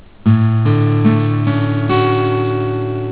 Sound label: chd dmj7, guitar